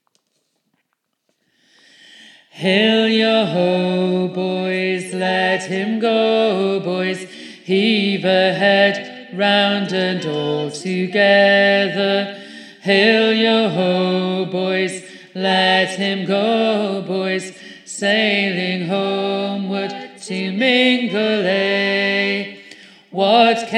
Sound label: tenor; mingulay